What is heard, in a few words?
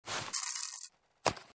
Eat; Troll Face Quest Unlucky; Android